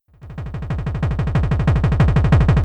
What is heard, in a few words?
Kick Roll In